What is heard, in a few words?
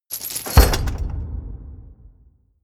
medieval menu heavy close